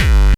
Bassdrums Hard Kicks; Vengeance Samples

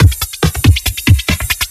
Breakbeat; Vengeance Samples; VEC2 Loops

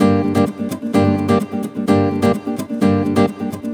Nylon Guitar - G min, 128BPM; Vengeance Samples; Special Sounds; Nylon Guitar Kit

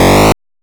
Arcade Riffs

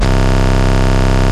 Basses C; Vengeance Samples

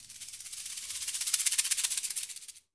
Shaker; Percs

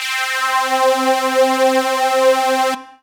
Synths C; Vengeance Samples

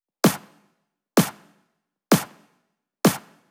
Drumloops 128BPM

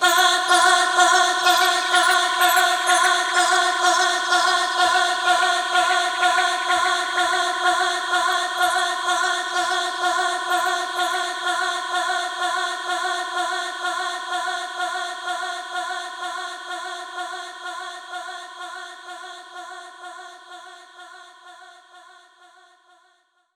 Reverb Athmospheres, FX Vocals; Vengeance Samples

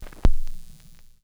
Record Start Clean, Vinyl Record; Record Noises

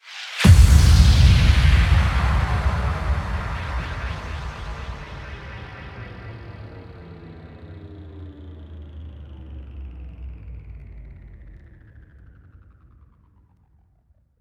Intro 132BPM Boom

